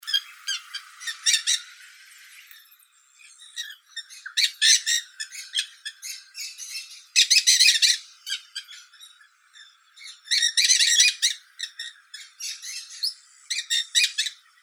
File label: Dusky headed Parakeet Aratinga weddellii